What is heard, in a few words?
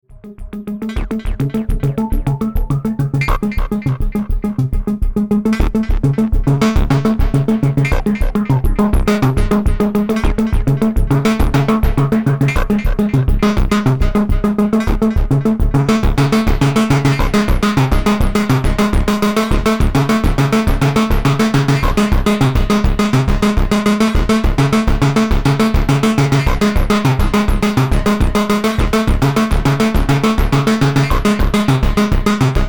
Motor Synth MkII Full Drive; Gamechanger Audio